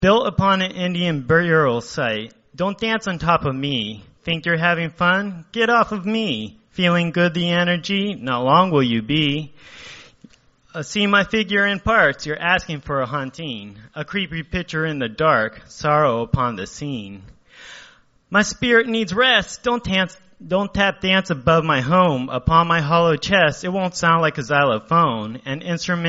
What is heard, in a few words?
Dubstep, Open Mic Nite; RED STRIPE; Hendershot's; Athens, GA